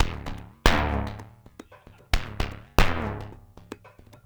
SPL RUBBER R; Spectrasonics; Liquid Grooves 1 & 2

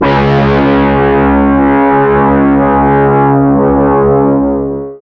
GUI, Metal Mix, DISTORTION; Sound & Vision; Gigapack I CD 2

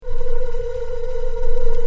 Ghost, industrial, FX

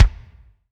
LO HT RM, SR-16 DRUMS; Drum Mashines; EdgeSounds

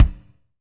BD, DR BD; Best Service Gigapack 2

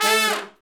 BRS, Section FX, R&R Falls; Roland L-CDX-03

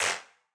Wider Clap

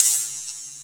HH, Drum & Bass, 160 BPM; Ueberschall; Drum & Bass Flash Trackz

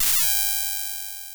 PRO1 NOISE; Crypton - DrumBase5000

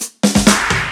Fills 128BPM, Clubsounds

